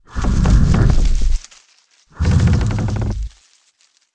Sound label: Soul tree; Seer; GamigoNA